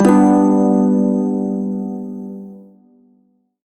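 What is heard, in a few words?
Shawzin Major Chord; Warframe; Prime Shawzin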